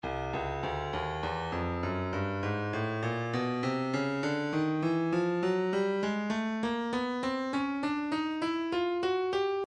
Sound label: breakbeat 050 bpm; Stylus Breakbeat Midi Files 050 059 Bpm; Timeless B